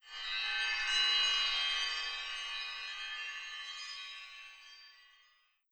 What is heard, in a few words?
Chimes; Yong Health